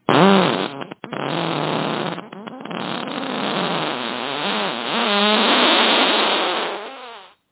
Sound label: farts